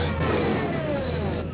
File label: flameout